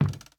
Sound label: Wood soft